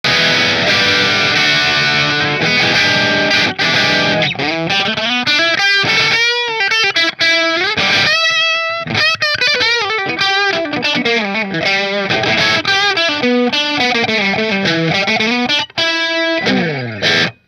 Nola Voodoo bridge split marshall, guitars; natural

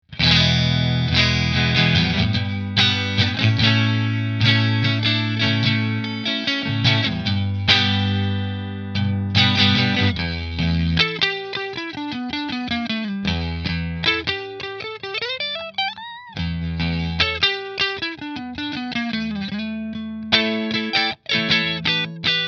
Tokai Strat Position 3 Fender, guitars

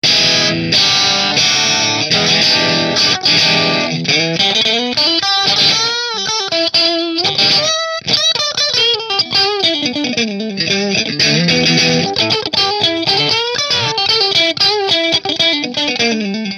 Tokai Strat Position 4 Marshall, guitars

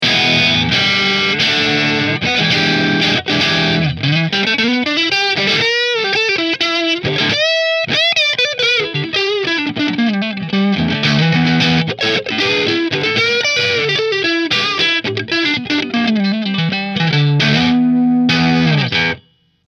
Position 1 Marshall; Rahan Guitars RP Double Cutaway Green Flame Maple Top

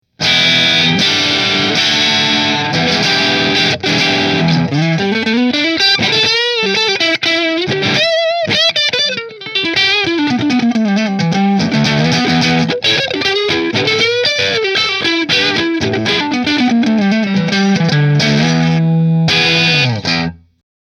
Nola JB14 Red Neck Split Marshall, guitars

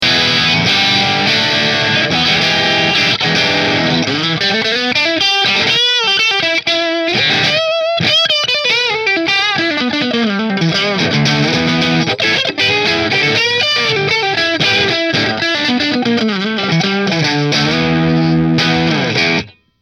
Warrior Satin Position 3 Marshall, guitar; Warrior Guitars Dran Michael Natural Satin

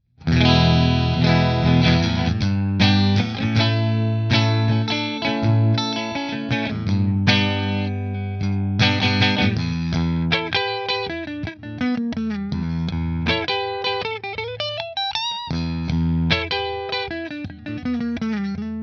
Nola Voodoo Middle Split Fender, guitars; New Orleans Guitars Voodoo Custom Natural Finish

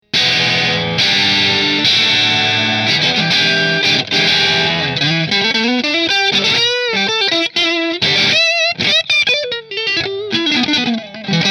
Fender Strat Kinman Position 1 Marshall, guitars

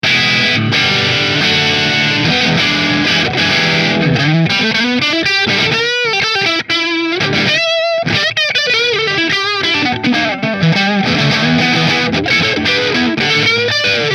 Heritage Neck Marshall

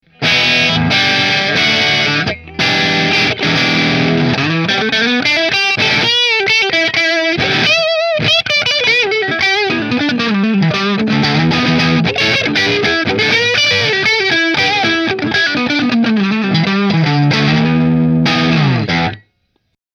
Gibson 335 Middle Marshall

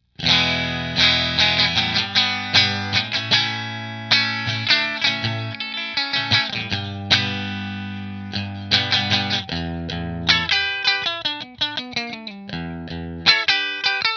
Partscaster Position 3 Fender, guitars; Fender Partscaster Stratocaster Custom Relic

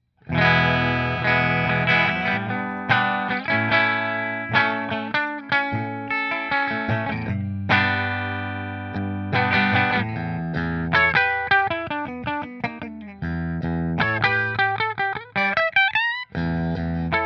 Nola Voodoo Redwood Bridge Split Fender; New Orleans Guitars Voodoo Custom Redwood Top

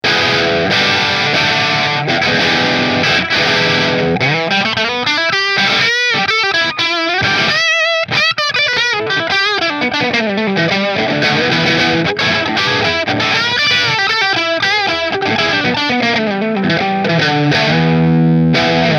Nola JB14 Custom Bridge Marshall, guitars

